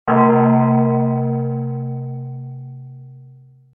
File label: chinese bell bong